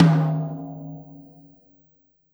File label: Tom Shard; ZeroGravity Drum Shards